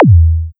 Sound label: Kick